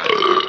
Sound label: burp short painful